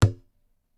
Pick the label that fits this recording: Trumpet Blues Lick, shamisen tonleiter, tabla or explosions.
tabla